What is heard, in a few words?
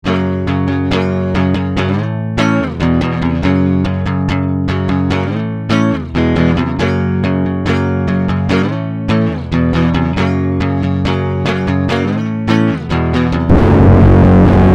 normal riff; deathbyaudio